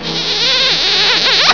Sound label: kiss, human